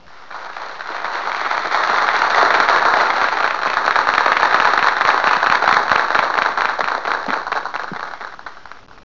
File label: clap